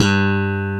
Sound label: thumb slap, bass; Greytsounds VOL-1